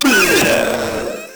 Proto Infested Runner Pain; Warframe

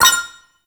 moto race menu hover; Warframe; Motorcycle Racing

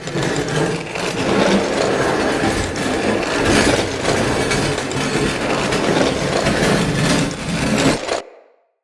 Knee Slide Loop; Tenno

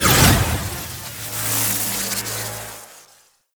Obstacle Course Vanish Platform; Warframe; Clan Dojo

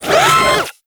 Thumper Bot Servo; Warframe; Grineer; Movement

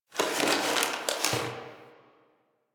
Box Of Trash Rustle; Warframe; Nineteen Ninety Nine

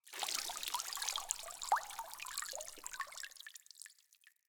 Water Shallow Stop Drips; Entity Contact Movement

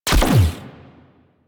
Turret Fire; Vehicles; Crew Ships; Weapons; Warframe; Rail Jack; Corpus Crew Ship

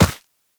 Run Dirt, Footsteps; Warframe; Characters; Operator

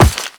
Run Rock, Footsteps; Warframe; The New War; Characters; Kahl

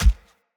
Step Default, Animals, Foley, Steps; Bromius

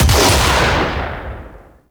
Shotgun Shot; Kahl; Symbilyst Finisher; Warframe; The New War

